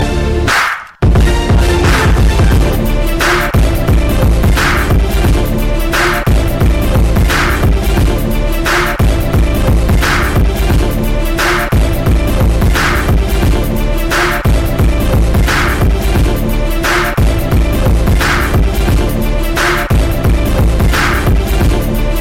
Nice Beats

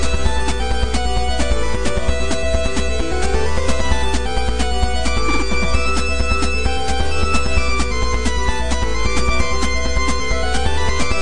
8 Bit; X Vs Zero

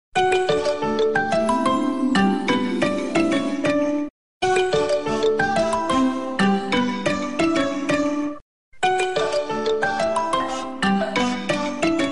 Iphone 4s Marble

